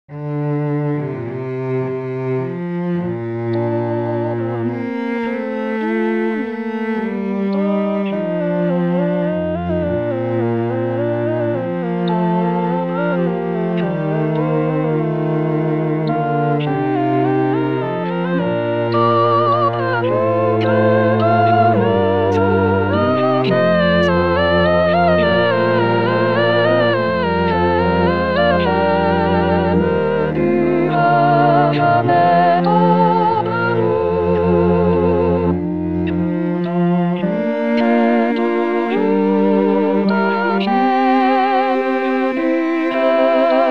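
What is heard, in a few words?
soprano; tendre amour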